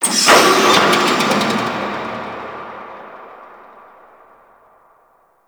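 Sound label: Shipyards Distant Machine, Ambience; Warframe; Grineer Shipyards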